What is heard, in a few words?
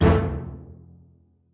orchestra hit melody kit; PADS and STRINGS